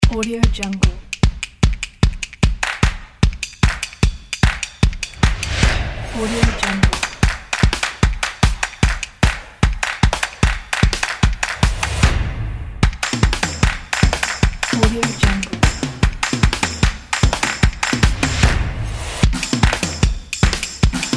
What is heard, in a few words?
percussion